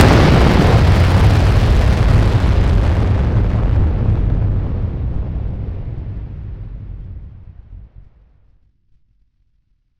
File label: Massive Explosion